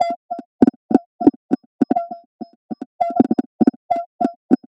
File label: perc 100, Percussive Loops, 100 bpm; inthebox